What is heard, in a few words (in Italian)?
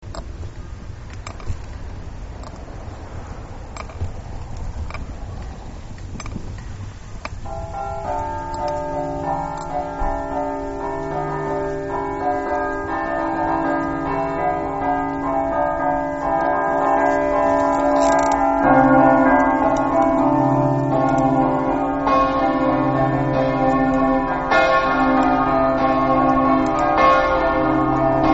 cassacco, campane